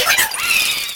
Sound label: Fan Chant; Mario Paint